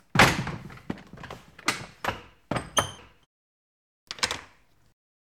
Closing Garage Gate

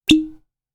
Medium Bottle Opening

